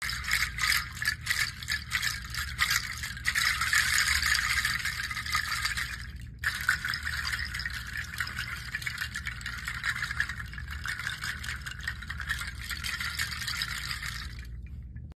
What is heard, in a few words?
Mixed Seed Shaker